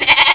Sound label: BAA; PowerMonger